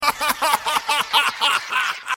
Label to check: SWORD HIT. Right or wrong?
Wrong